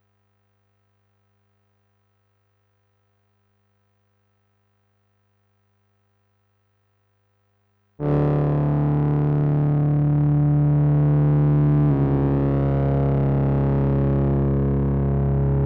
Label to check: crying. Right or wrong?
Wrong